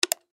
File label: Button Switch; Multimedia Web Interactive; Technology; Blastwave